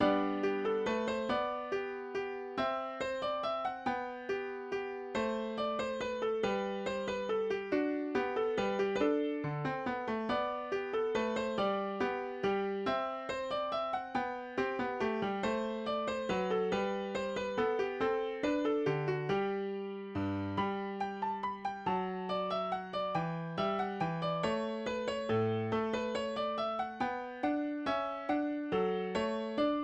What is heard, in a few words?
minuet in g